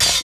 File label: OH, HATS; Itchy; RoBKTA Sample Pack Supreme